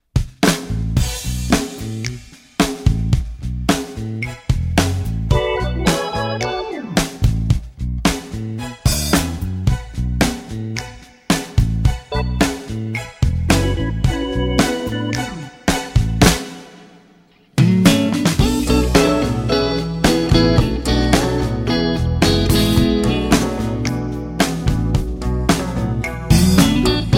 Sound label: closed door jam